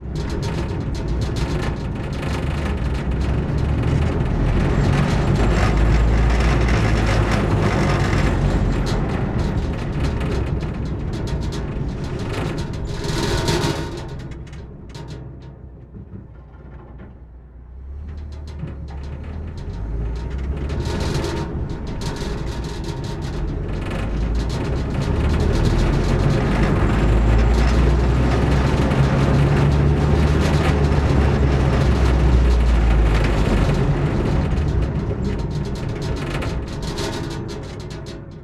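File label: m16 halftrack onboard drive ramps various three times rear flatbed; Pole Position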